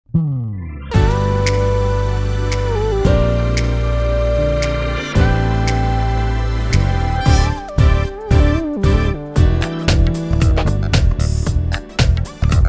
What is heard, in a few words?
Let him lead Playback